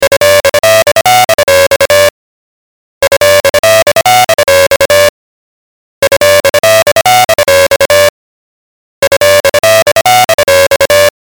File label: ring tone presidential